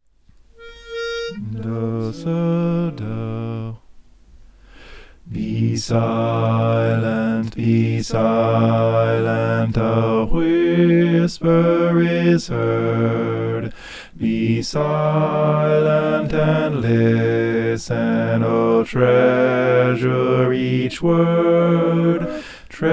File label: bass